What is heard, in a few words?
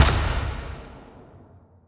Percussive Stab